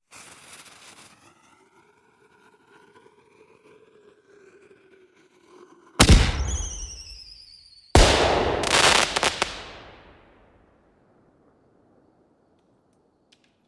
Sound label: explosion hiss bop bang